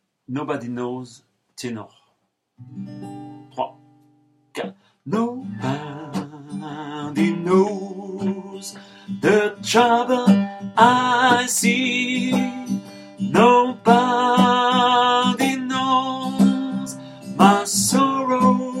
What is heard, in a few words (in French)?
tenor; nobody knows